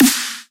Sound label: soft hit clap; osu